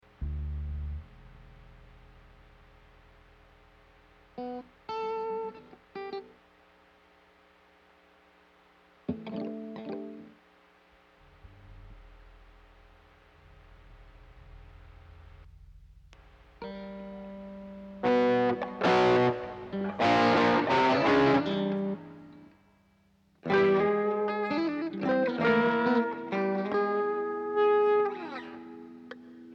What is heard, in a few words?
Strings